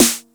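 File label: snare, TR909; DRUMMACHINES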